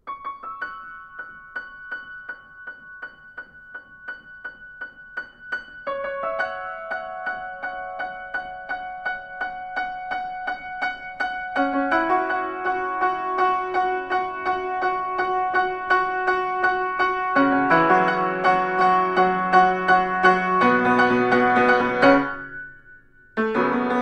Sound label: piano cover